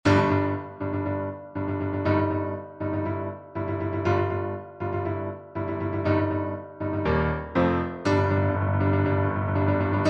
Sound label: avengers main theme piano